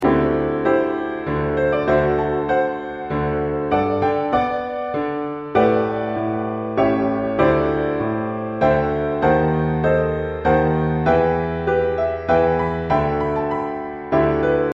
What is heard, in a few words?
Piano